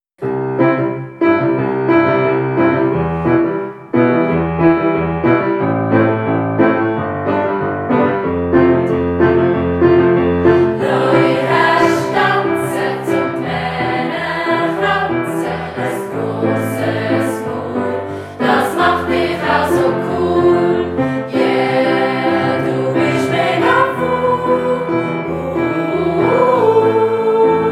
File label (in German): 4-stimmiger Kanon